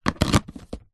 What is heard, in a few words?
food candy shop boiled candy tub plastic lid on; zapsplat